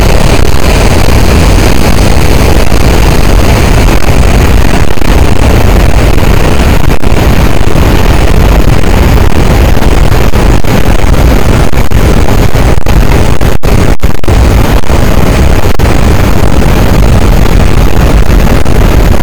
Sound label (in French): cachalot, boat; Physeter Macrocephalus